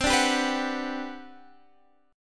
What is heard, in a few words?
G Major 7th, C; Gmaj7/C; strummed